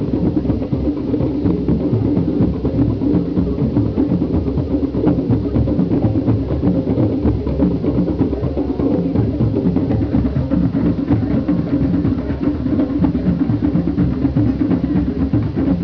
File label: drum circle